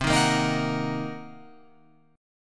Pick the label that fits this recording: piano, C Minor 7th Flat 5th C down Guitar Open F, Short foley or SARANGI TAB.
C Minor 7th Flat 5th C down Guitar Open F